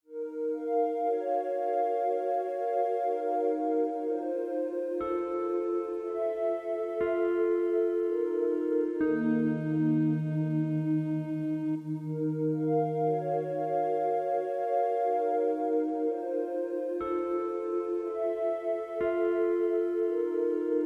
Drone, Ambient

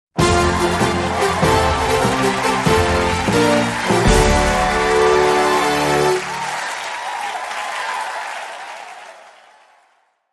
Big Fanfare with Crowd; Crowd FX

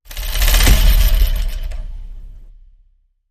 Film Projector Tape Hit

